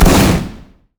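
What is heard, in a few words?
fireworks missile barrage; Fireworks Corki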